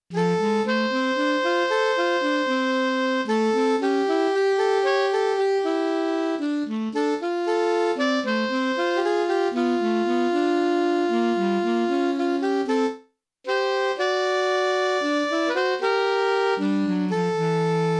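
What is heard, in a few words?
Alto Sax, STANDARDS ALTO SAX DUETS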